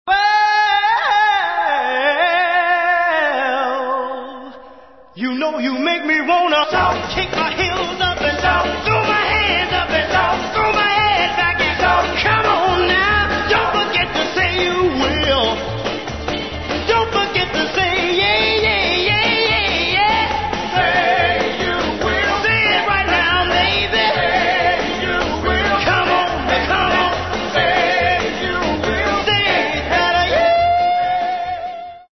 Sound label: shout, crowd